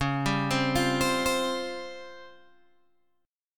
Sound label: C sharp Major 7th Flat 5th Guitar Open G; down; chord shape